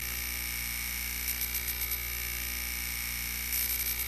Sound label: draw loop; Ben 10 - Escape Route